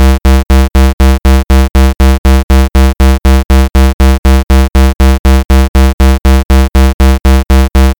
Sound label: metronome; procjam